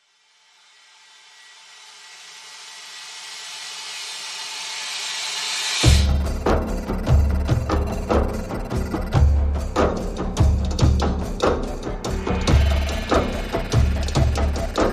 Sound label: Full With Small Perc